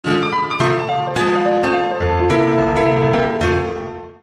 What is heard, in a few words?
italian jazz